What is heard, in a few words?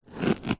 foley foam block dense slice score sharp knife tip